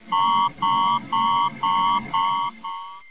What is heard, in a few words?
alert